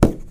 ceiling tile impact hard, plaster; physics

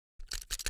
deagle special lookat; weapons; Counter Strike Model Pack; CS2

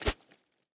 fist swing; physics

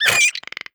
scared back off; creatures; headcrab classic; hideout

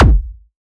mg lfe, weapons; combine; Half Life Alyx

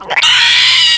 scream, headcrab poison; Half Life 2

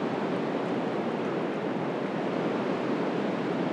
office outside tone, ambient atmosphere

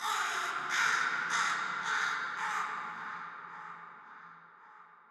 crow, ambient, nature, birds; Portal 2

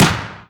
poison shoot

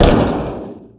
land metal vent, footsteps; player

